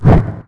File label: frog attack